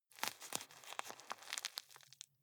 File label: juggle squeeze